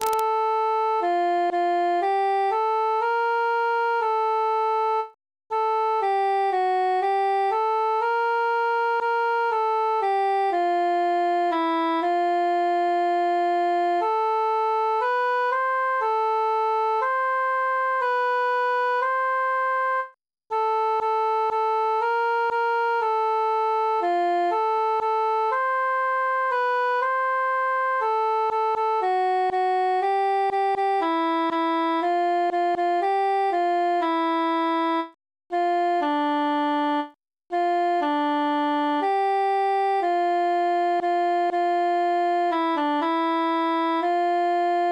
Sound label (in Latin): cantus solo; o occhi